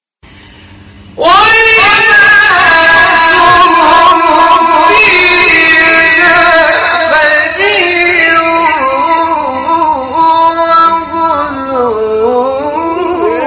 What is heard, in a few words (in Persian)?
تلاوت آیه سوره مبارکه حج; آیه 24; حامد شاکرنژاد